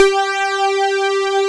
JUNO POLY G4; E-MU Formula 4000 Series Vol. 5; Protozoa; Vintage Presets

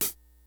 GREASY KIT, DRUMS; Kid Nepro; Elite

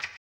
HAMBONE; Blues & Soul

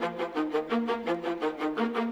Viola, Orchestral; Sonic Foundry (Sony Creative Software); Orchestral VOL-2

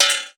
SOUND EFFECT, Metal; AKAI S6000 CD-ROM - Volume 1

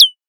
Borg Perc, drum kit; Polyend; Stazma & Concrete Collage; Concrete-Dual Borg

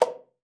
B4, alto sax; Xsample VOL-2

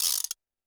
Roland TD-20 Percussion

